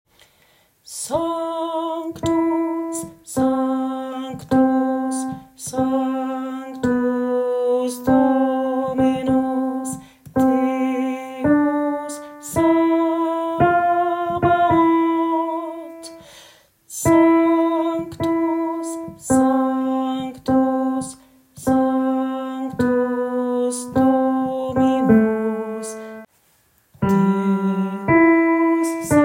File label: tenor; Sanctus